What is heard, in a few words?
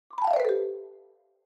Xylophone Down